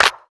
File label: clap